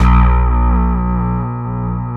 ESQ 1 DIGI, Synthesizers

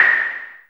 JUNO NOIZ MD, JUNO PERC; Drums In Your FACE!

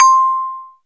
vibraphone; SN-R8-07 Mallet

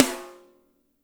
SNR; Spectrasonics; Burning Grooves

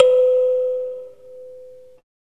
AFRICAN INST; OMI - Universe of Sounds

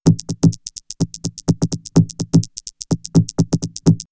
Vocoder Loop; X-Static Goldmine; VocoderLoops

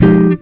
GTR CHORD; Univers Sons; Jazzistic

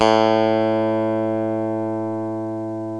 ELECTRC BASS; OMI - Universe of Sounds

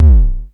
KLONE BASS HIT, Klone Dual-Percussion-Synthesiser; Drum Machines

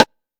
TR707 Rim; Roland SH-32; Drumset 04

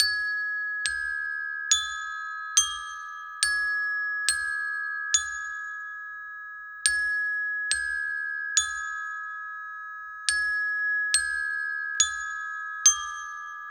Cinematic Bells; Sonic Foundry (Sony Creative Software); Orchestral Series VOL-3 - Cinematic

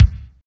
KICK, Tekno Industrial; East West

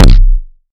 TRAMP KICK

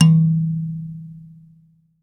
kalimba; Voice Crystal - Steve Reid Definitive Percussion

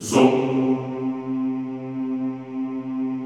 ZOOMMM C2, Classical Choir; Best Service; Extended Classical Choir

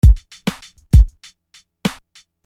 Beat, Hip-Hop Street Beats

